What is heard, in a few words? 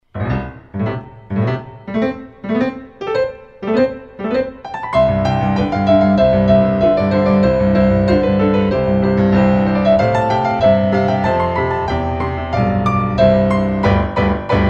Contradanse; Beethoven